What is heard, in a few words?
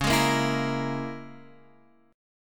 C sharp Minor 6th, Guitar Open F; C#m6; strummed; JGuitar